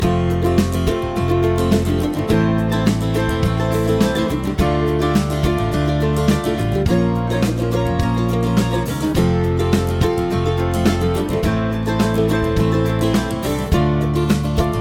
chord prog with mixture

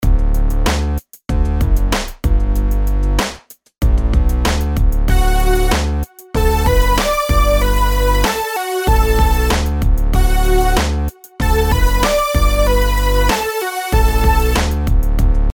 rhythms simple 8s major

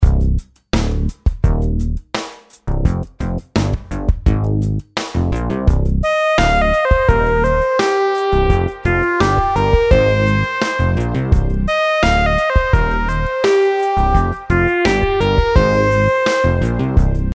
rhythm simple 8s triplets

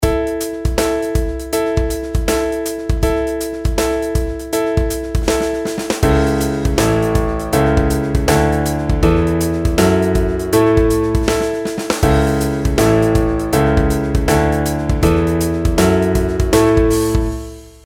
pitch patterns skipwise

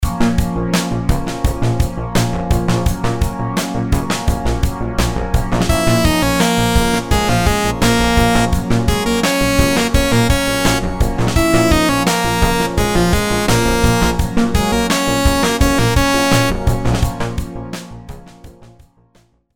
rhythms simple dotted 8s 85

